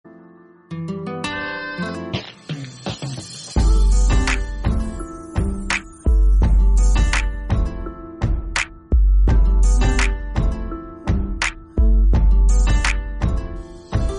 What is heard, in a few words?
instrumental ringtone; bollywood